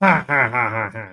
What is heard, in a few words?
da shi ha ha ha ha ha